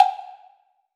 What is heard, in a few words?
BLOCK, Drum Mashines; EdgeSounds; Drum Mashines VOL-1; PROTEUS1 DRM